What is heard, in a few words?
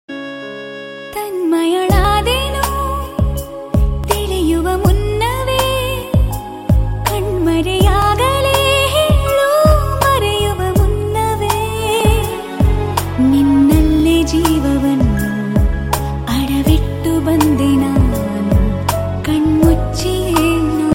malayalam